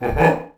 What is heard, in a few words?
tiki laf; hawaii